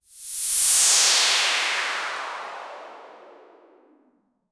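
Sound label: slow smooth high pass filter, sweep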